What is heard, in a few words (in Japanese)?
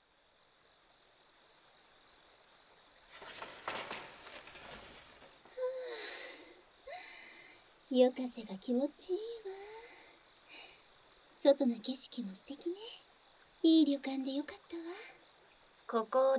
耳舐め, 効果音有; G2コネクト; 姉さん達と旅館に来たら愛されすぎて何度も搾り取られる僕; 旅館で姉たちに耳舐めさせる僕